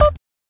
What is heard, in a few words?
Bop Snare; Sonic Foundry (Sony Creative Software); Pocket Diva World Class Vocal Samples